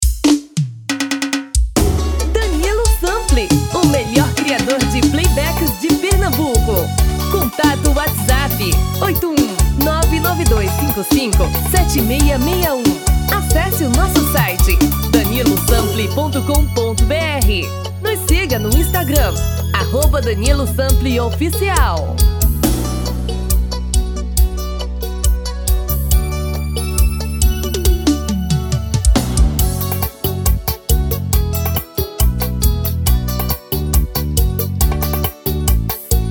tom feminino

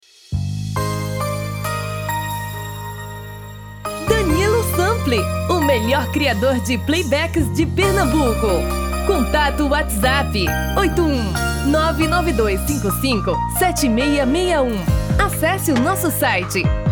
tom feminino

